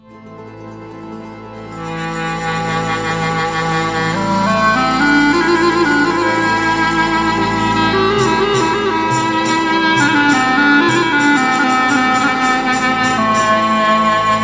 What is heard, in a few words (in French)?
Valle e Zagorise, Albanie